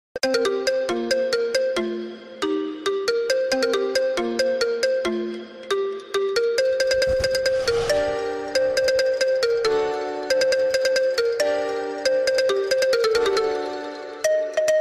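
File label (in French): nouvel iphone, sonnerie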